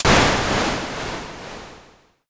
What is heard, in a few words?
thunder